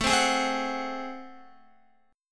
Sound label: A Minor Major 13th, down, Guitar; strummed; AmM13; JGuitar